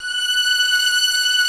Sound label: ARCO VIOLAS; OMI - Universe of Sounds